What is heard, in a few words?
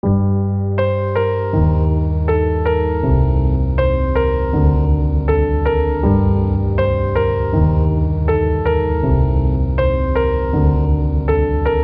Drum Piano